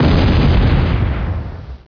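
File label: EXPLOSION MEDIUM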